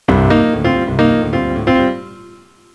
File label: Drum